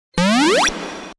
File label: heal; Pokemon Red Blue; first gen; gamesoundopedia